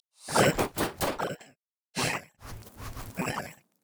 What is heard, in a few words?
lizard elder bored; LoL; monsters